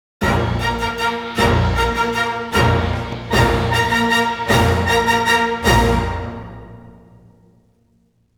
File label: Groove With Intrigue Sting 154BPM 4-4; Evil Genius 2 World Domination; PS4; Xbox Series X/S